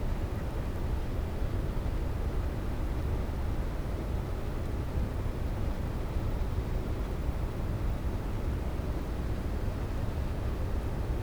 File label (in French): cachalot negatif, Physeter Macrocephalus